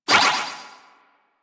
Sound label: Alchemist Transmute Ability Pickup Convert; Warframe; DE; Warframes